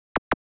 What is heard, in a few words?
Space Drum Loop - 95 BPM Lazer; Loop Stems; Cymatics